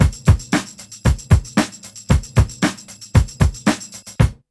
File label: BEAT, Roots Disco; USB Soundscan vol.32; AKAI